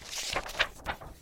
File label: page flip heavy; OneShot Fading Memory